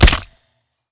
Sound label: creep damage; Counter Strike Model Pack; CS:GO; survival